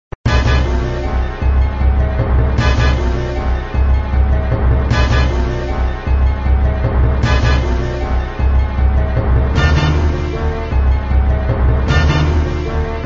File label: Music, flipper; sttng